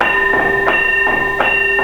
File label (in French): trois coups